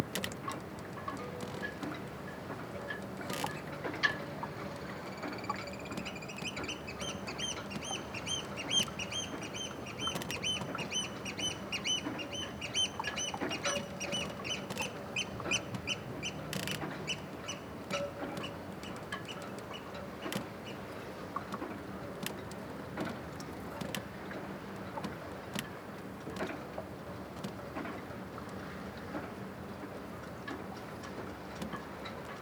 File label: Sailing